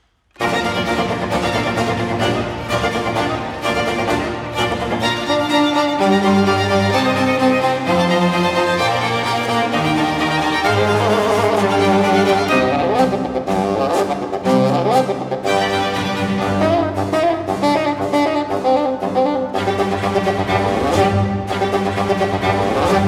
Concerto for fagotto, strings & b.c. in B flat major; Antonio Vivaldi (1678 - 1741); Vivaldi - Concerti per fagotto e oboe (2004); RV501 'La Notte'; 2. Fantasmi